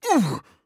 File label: Grunt Hit; Khan